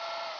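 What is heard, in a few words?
camera rotate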